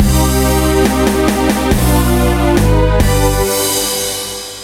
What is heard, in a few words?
Lose music